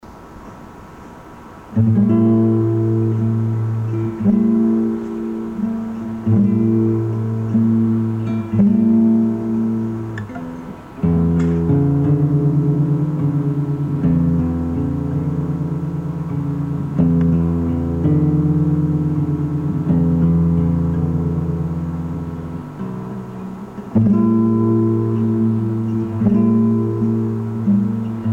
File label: Guitar